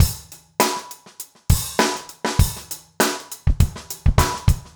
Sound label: basic funk 100